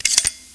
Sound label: elite clip out, Gun Sound Effects; HeroEditor4D